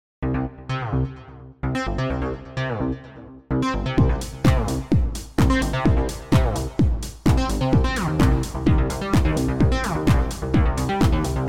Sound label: serial FM 3op; DUNE2